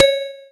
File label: ding; linux-virtual-jay-peak; soulride